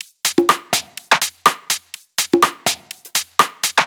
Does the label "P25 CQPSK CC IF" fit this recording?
No